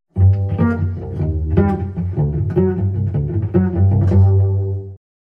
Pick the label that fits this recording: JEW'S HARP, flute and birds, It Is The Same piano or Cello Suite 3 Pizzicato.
Cello Suite 3 Pizzicato